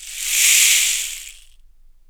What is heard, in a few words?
SHAKER AFR, Drums; SHAKERS; Big Fish Audio; Ross Garfield Drums VOL-2